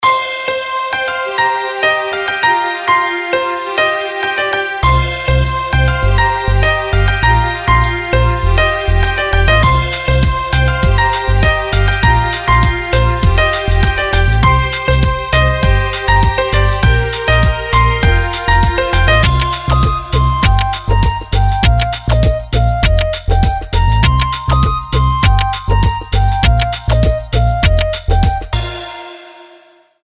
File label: Piano Bliss; epic and uplifting